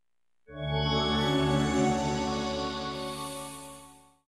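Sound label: PlayStation startup; psx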